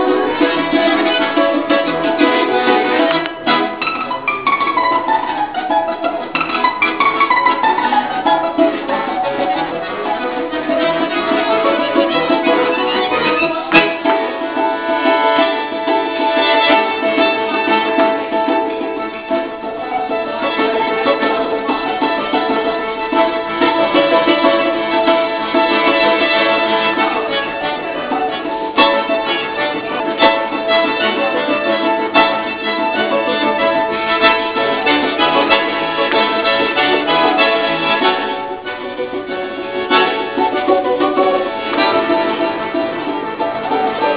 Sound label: russian musicians; Moscow; Dancers